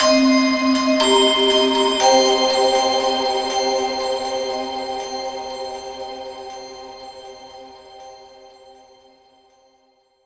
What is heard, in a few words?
Bells - Cm 60Bpm; Construction Kits; STEMS; Ghosthack; SkyRealm; Kit 06 - Before The Storm